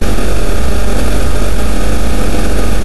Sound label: ambience